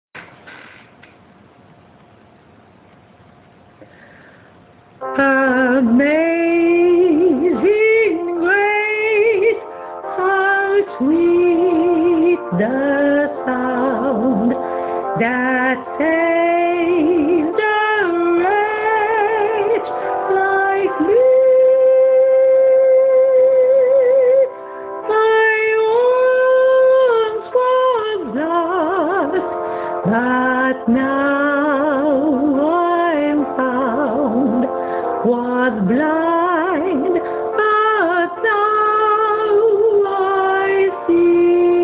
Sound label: amazing grace sop; amazingrace